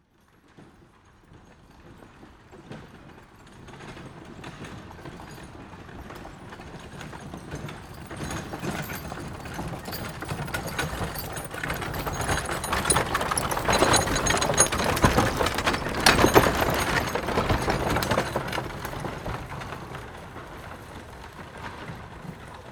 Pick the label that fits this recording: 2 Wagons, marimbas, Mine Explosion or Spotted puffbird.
2 Wagons